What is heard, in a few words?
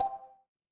feedback merge